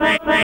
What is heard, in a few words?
CROON HORN; Spectrasonic Distorted Reality 1